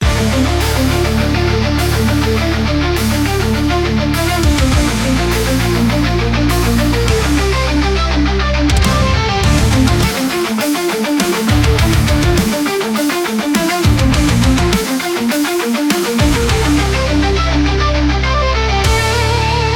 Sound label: the sandmann 102bpm